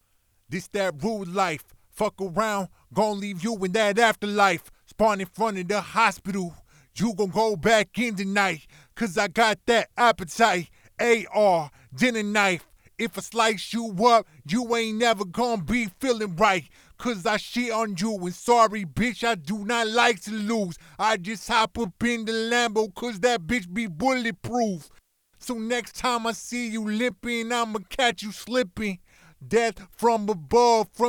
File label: hip hop 122 Amaj